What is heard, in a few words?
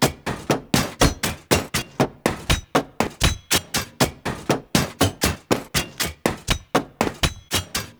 Wall Climb Movement Step Metal Loop; Warframe; Oraxia